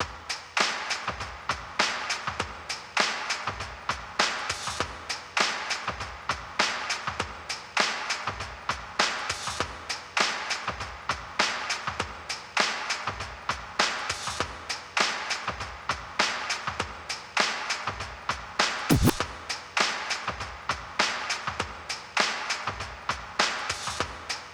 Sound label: 100bpm light staccato cello BEATS